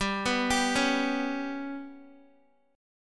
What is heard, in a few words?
G Major Flat 5th, down, Guitar; GMb5 arpeggiated; JGuitar